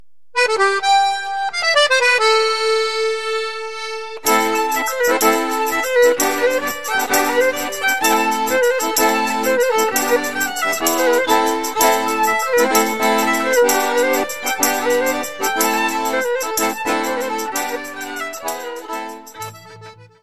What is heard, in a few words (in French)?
GIGUE - France